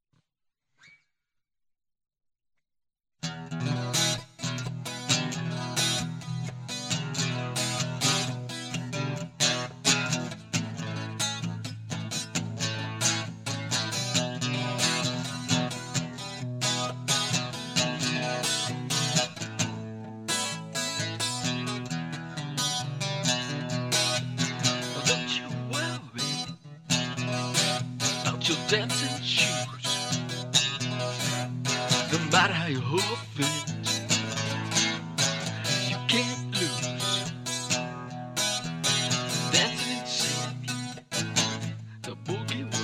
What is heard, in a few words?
Boogie Woogie Blues